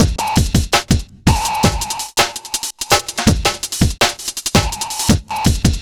Cheep Beat, Drum N Bass; Sonic Foundry (Sony Creative Software); Knutrix Drum & Bass

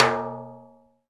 rimshot Timbales; E-MU Producer Series Vol. 2 – More Studio Essentials; Composer; Timbales Xswitch

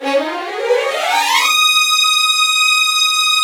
STR UP, Vlns Bow FX, Runs; Roland - String Master Series; Vls Runs

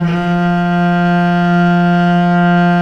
VL F2, VIOLE, Historical Instruments; USB Soundscan vol.23

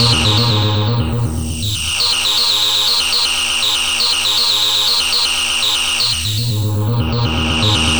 Synth Arpeggio, Synths; Eclectic Electronica

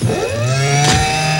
ROBONIC; Northstar; The Wizard VOL-1

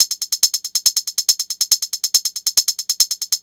NRG Cymbal

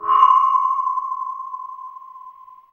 Synth Perc; Dream Zone

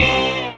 Dance Mega Synth; Best Service; 90 s Sample CDs

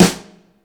SNAP SNARE; InVision Interactive; Lightware VOL-1

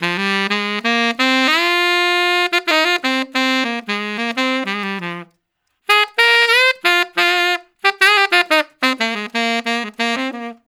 Tenor Sax; Sonic Foundry (Sony Creative Software); Jazz Solos and Sections; Tenor Loops

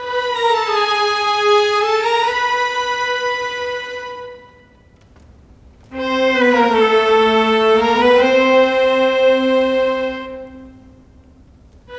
Cello Violins, Cinematic; Sonic Foundry (Sony Creative Software); Orchestral Series VOL-3 - Cinematic

